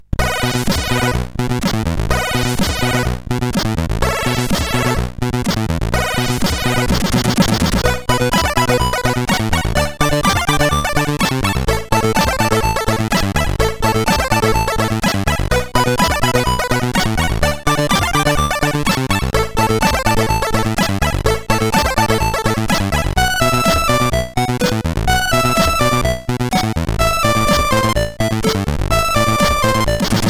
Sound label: New Party Tune, CSG8580R5